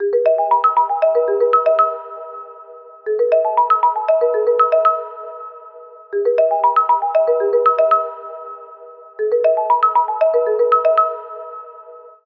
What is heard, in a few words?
ringtone; ohos5.0; player framework; audio haptic